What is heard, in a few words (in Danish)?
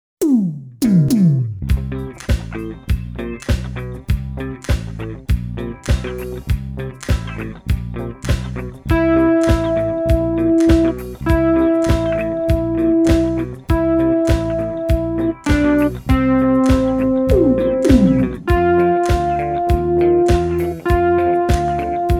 Filmmelodi Dance Disco